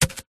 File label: sport cricket bat drop grass